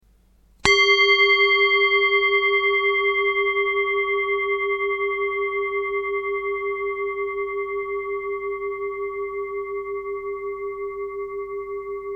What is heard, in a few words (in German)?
Klangschale